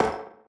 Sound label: pipe step; Golden Crayon Sounds